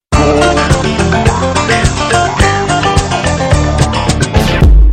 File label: english background music tone